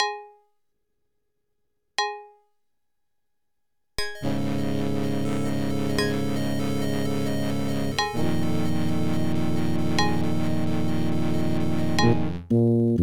OPL3

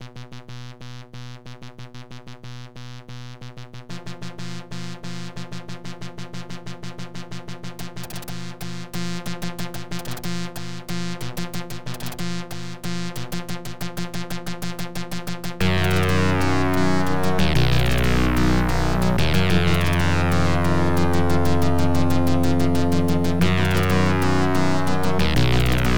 OPL3; DOOM2

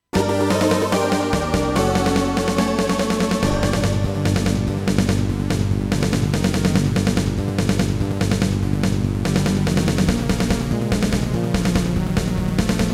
SC880; Dune 2

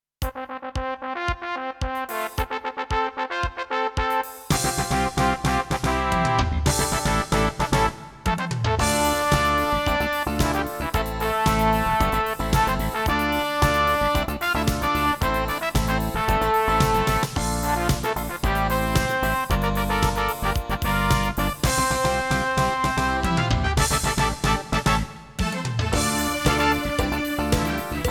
CM500 GS

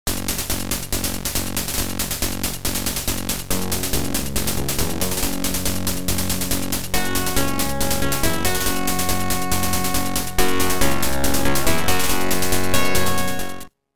OPL2; Intel Update

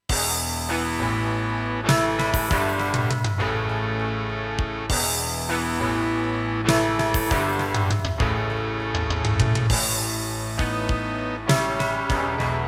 SC880; QFG3

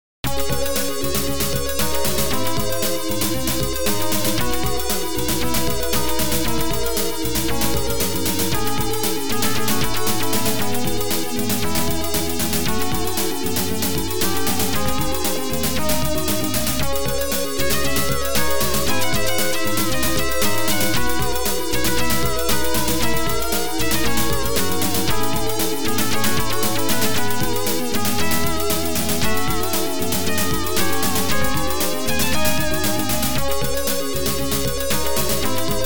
OPL2; Ending Number 1; Tyrian

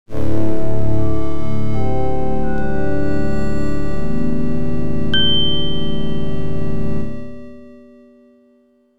OPL2; LucasArts Logo; YAMAHA OPL2; Star Wars: X-Wing

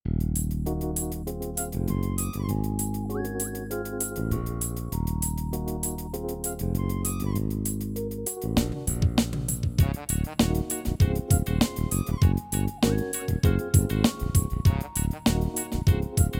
SC880

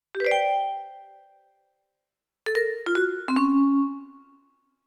CM500 GS; Larry 3 passionate patti in pursuit of the pulsating pectorals

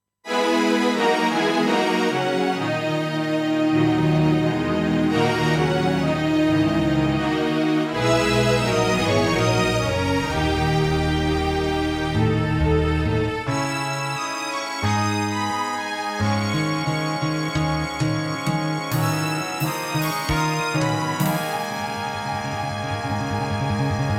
SC880; QFG3

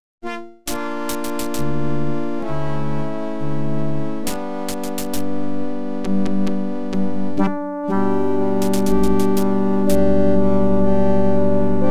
OPL2; X WING

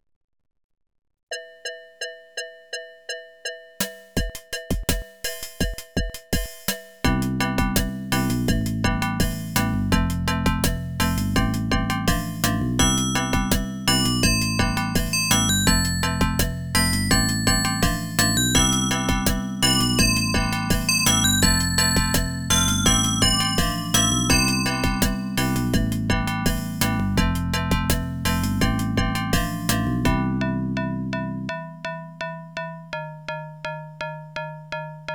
ADLIB OPL2

